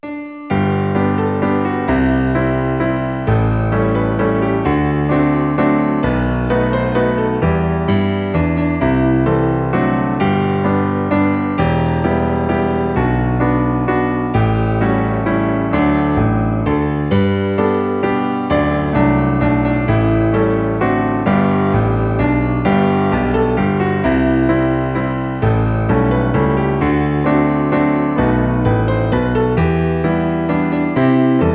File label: Piano; We Wish You A Merry Christmas